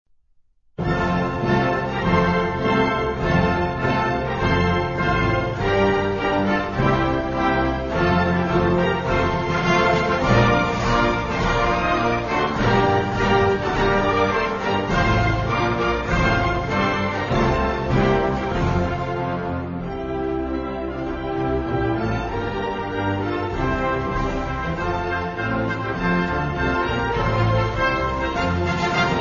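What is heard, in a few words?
anthem, Belarus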